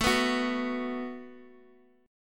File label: F sharp Minor Double Flat 5th A